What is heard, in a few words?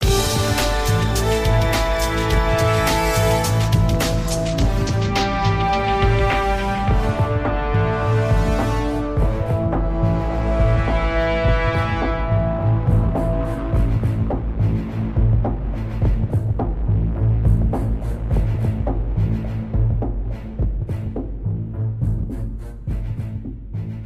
Success